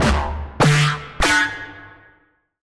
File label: SFX - Wobble Swoosh; Routed Sound; Sylenth1 Expansion; Chaos Bringer Vol.1